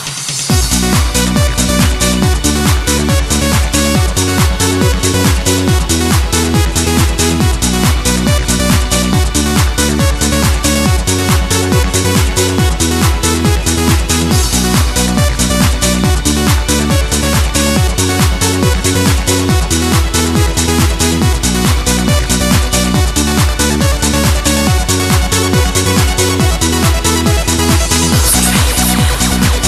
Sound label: music sport morning